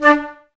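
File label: flute, Woodwinds; Sample CDs; Miroslav Vitous; Woodwind Brass Ensemble; Banks W&B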